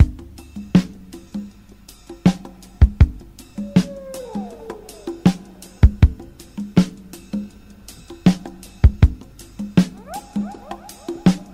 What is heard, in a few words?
jungle noises, drum loops